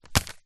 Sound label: food packet jelly crystals drop on surface